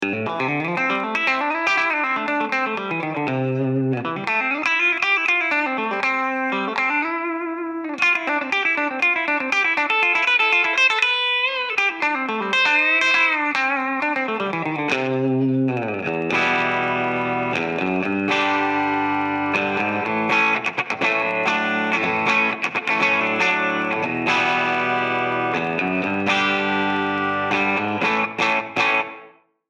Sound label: CLEAN SOLO